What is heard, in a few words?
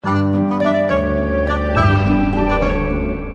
ringtone